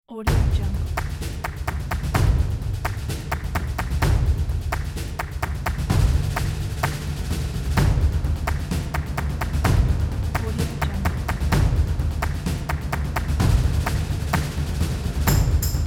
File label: thunderous drums and claps